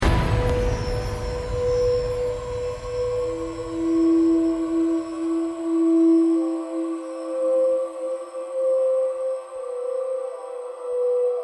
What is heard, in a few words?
Exploration; orbs macos windows gamerip 2017